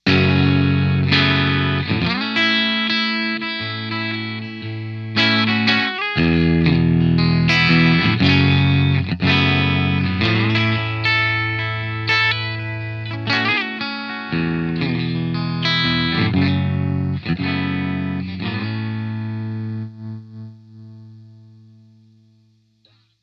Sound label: electric guitar mtp550 dm; lewitt